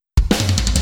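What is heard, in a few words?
BGM Skyscraper Intro; Fallen Knight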